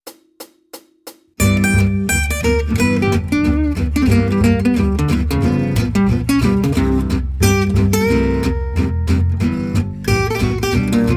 Jazz, Guitar

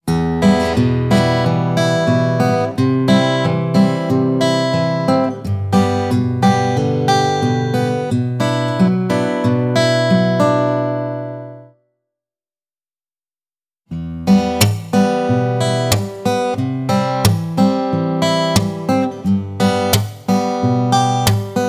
Acoustic Strum, Tap, Slap; Guitar Techniques 2018 Issue 289 December

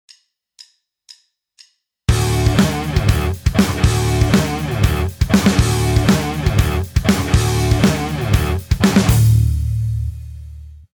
backing; In The Woodshed ex5; Guitar Techniques 2017 Issue 272 August

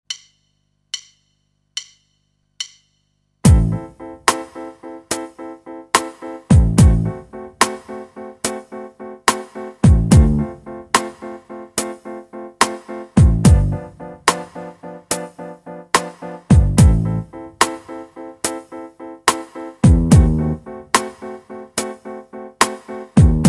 Rhythm 12-8 blues backing; Guitar Techniques 2017 Issue 275 November

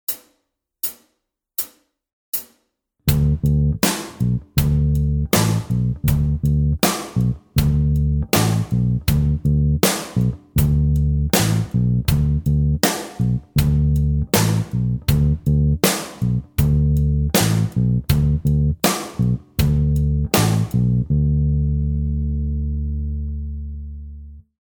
Slide backing, Guitar Techniques; Guitar Techniques 2018 Issue 289 December

